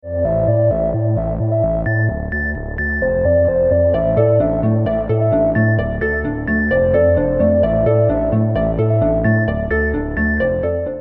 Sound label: Sweet Sms